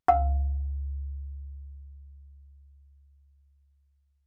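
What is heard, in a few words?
Marimba cord fortissimo Db2; MIS Pitches - 2014; Percussion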